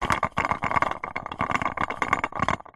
lamp plastic shake wobble loose rattle; household; zapsplat

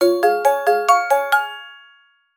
app alert tone ringtone

